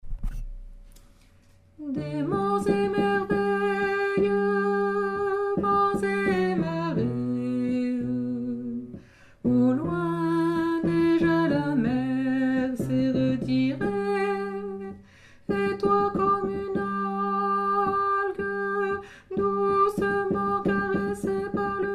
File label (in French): basse